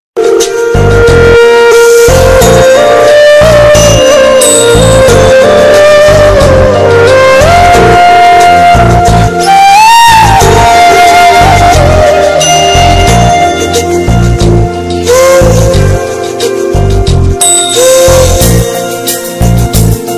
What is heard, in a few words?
Sad Flute Music Flute Ringtone